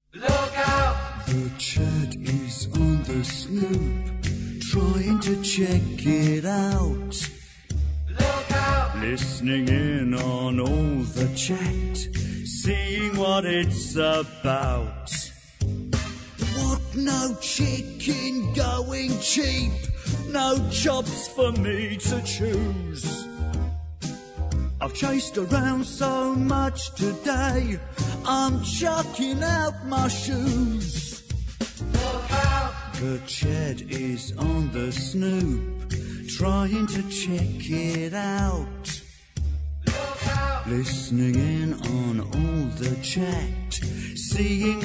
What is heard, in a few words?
song